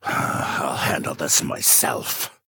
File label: attack; black marsh centurion